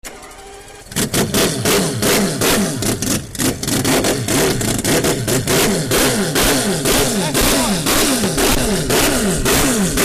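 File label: 787b, Mazda 787B Sounds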